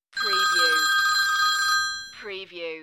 Old Phone Ringing

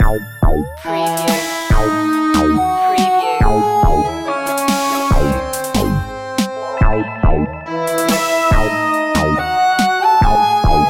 alien pets loop

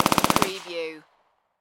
Machine Gun MP40 Long Burst

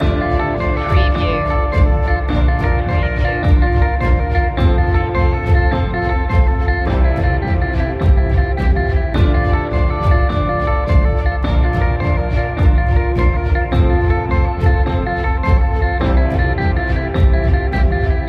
guitar arpeggio loop

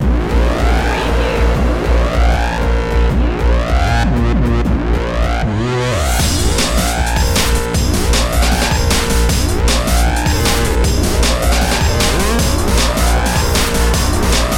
sound the alarm

